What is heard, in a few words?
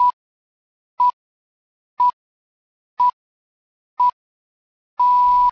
pips